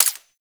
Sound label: Bladed Pistol Mech; Warframe; Weapons; Tenno